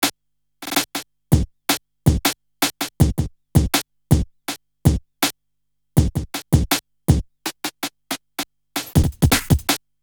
breaks, Jungle; Zero-G Jungle Frenzy 1

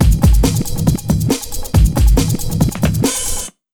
Big Beat Drumloops, 138 BT

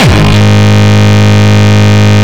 Longkick, kicks

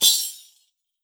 tamb, percussion; Best Service ProSamples vol.52 - World Instruments 2; MIXED PERCUSSION

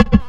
PERC FX, Big Beat; Big Beat Samples & More; AKAI

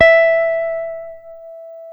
E 4 JAZZ GTR; Univers Sons; Jazzistic

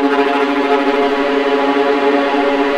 VLA C1 TRM L, VLAS TREMOLO

